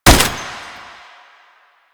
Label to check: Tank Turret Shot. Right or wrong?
Right